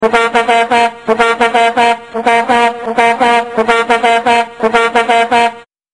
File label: Vuvuzela Blasts